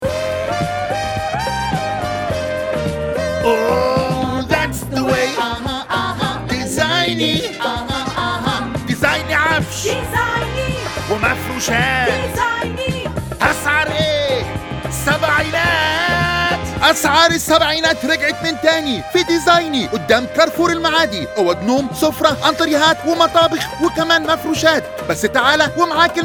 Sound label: jingle; radio commercials